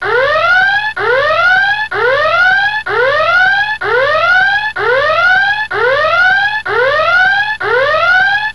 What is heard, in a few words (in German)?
Alarm 8x